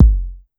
Kick 909 Down, Pitch Env; Clavia DDrum-AT; Pitch Env Various

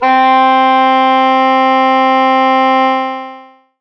Ethnic Synths, Dance Synths

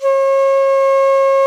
VENU C#4, Winds; E-MU Producer Series Vol. 7 – Old World Instruments

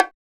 BONGO MID, BONGOS; Drums In Your FACE!

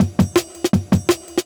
Jungle Breaks

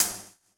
Fx Vox; Equipped Music; Loungin House

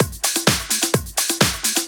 Latin Loop 128 BPM

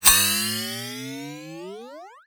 Zip Boing, cartoon

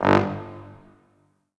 Orchestral Brass

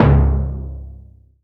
F timpani; AKAI S6000 CD-ROM - Volume 5

